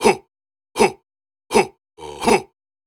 Vocal Rhythms 80Bpm, Viking Vocal

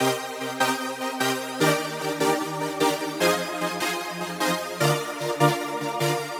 Chord Loop G m 150 BPM, Synths; Loops; Cymatics; EDM Starter Pack

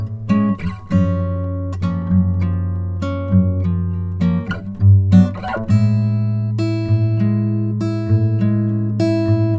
Xmass Guitar Loops 100bpm Amaj; Guitars; Ghosthack; Advent Calendar 2019

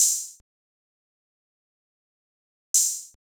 Drum Loop 175 BPM Open Hat, Hip Hop; Cymatics; Telefono

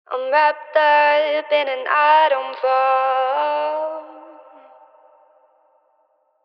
Phrase Wet 80 BPM C Min, Vocals; Autumn Fall; Cymatics - Eternity Sample Pack; Phrases

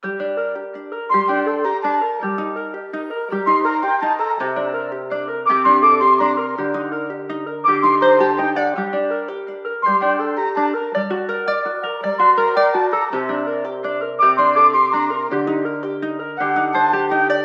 Various Melody Loop - 110 BPM G Min; Cymatics; 2020 Melody Collection

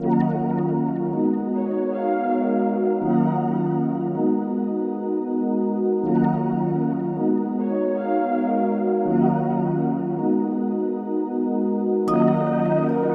159 BPM D# Min, Melody; Cymatics; 2022 Melody Collection Platinum Expansion

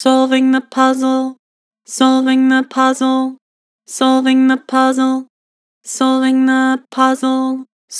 Vocals, Flat, Solving the puzzle; Harmor

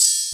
Open Hihat - Metal; Drum One Shots

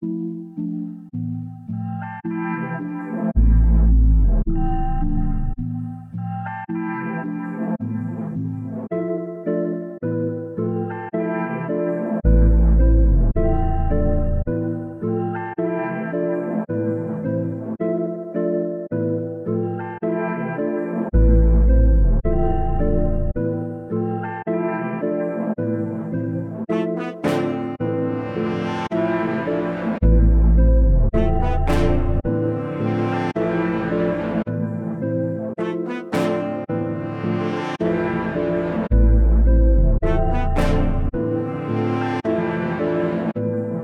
108 BPM A Min, Melody; Cymatics; 2022 Melody Collection; Wavy

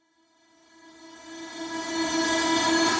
Tonal Reverse - E; FX; Iris

